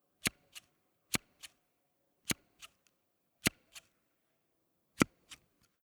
Gartenset Schere schneiden

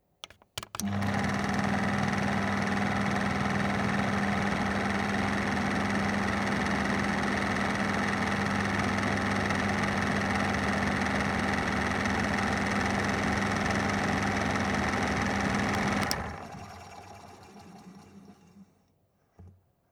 Filmprojektor Tondo anschalten ausschalten

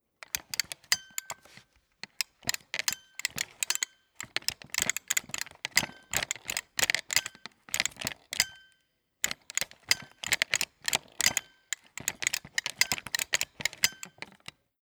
Highscreen Joystick Knopf spielen

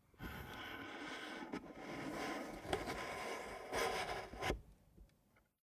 Portables Radiogeraet TS502 Antenne ausfahren

